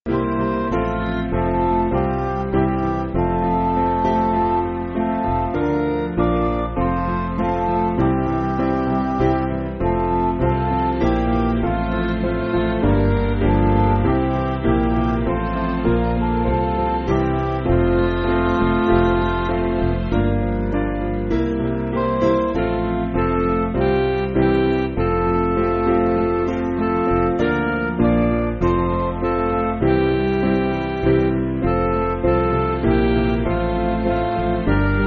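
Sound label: Midi, Music Backing Files for Churches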